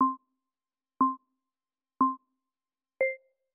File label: countdown